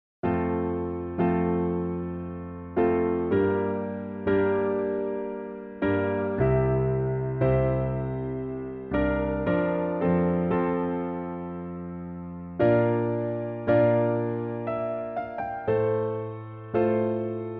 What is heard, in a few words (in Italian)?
lascia piano; funerales